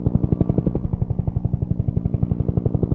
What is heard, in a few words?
heli Loop; netent; narcos